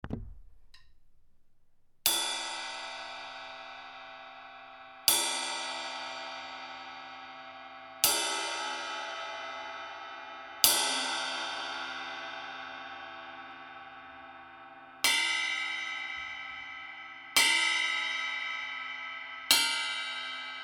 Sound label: Ride 20; Taurus; Amedia